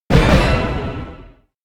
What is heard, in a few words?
quiz start deden; anemoi